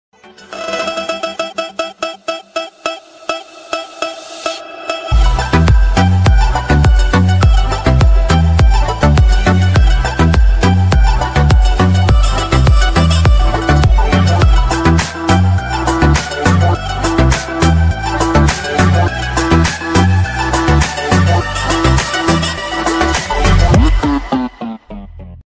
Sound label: car bgm ringtones instrumental; new english ringtone 2021